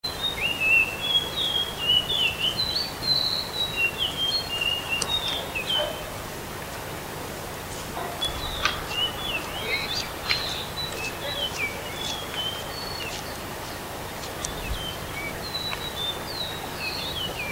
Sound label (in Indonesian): suara kicauan ciung